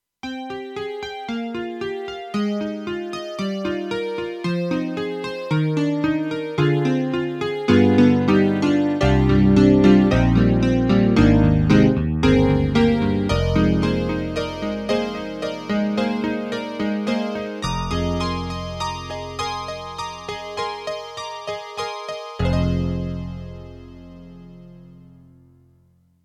CASIO GZ-70SP STUDIO PIANO

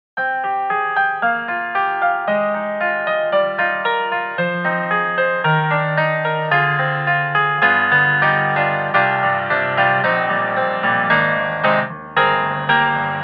Custom C7 Vinyl; Spectrasonics; Keyscape

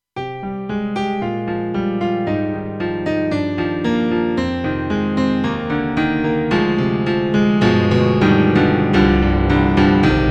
Korg X5DR Piano 16'

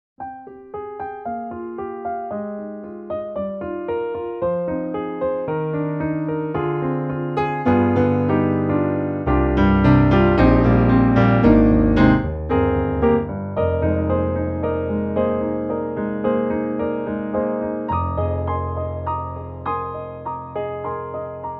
Roland SRX-02 On Mic Premier (normal) (XV-5080)

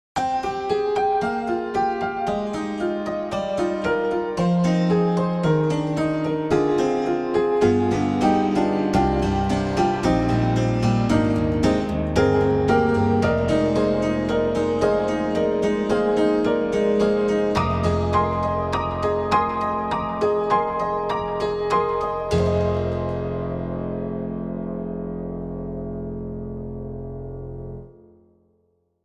Tack Piano Forsaken; Spectrasonics; Keyscape; Wing

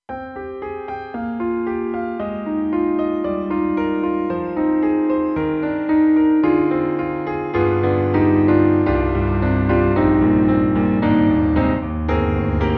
Acoustic Piano; KORG M3 XPanded Program

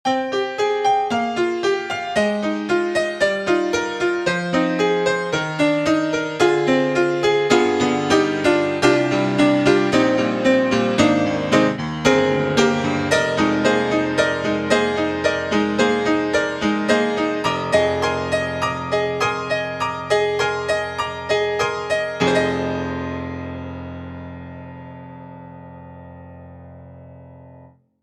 Keys Modern Upright House Keys; XLN Audio; Addictive Keys

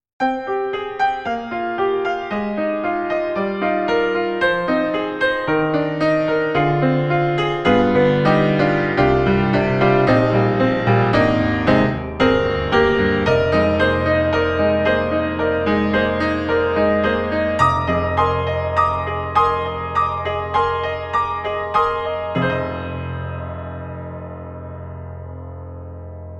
Virtual Grand Piano, Vintage Soul; Art Vista; Virtual Grand Piano 3

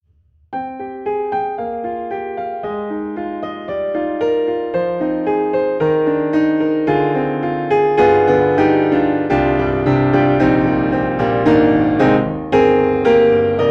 Pianoteq D4 3 Mics; MODARTT S.A.S.

